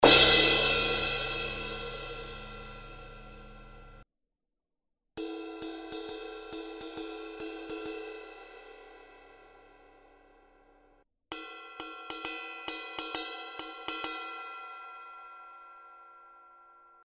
zildjian 18 inch a zildjian cie vintage crash crash ride bell; cymbal